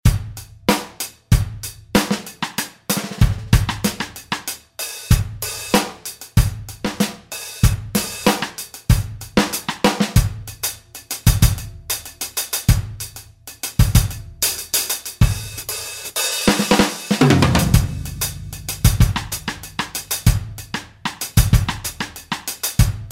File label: jazz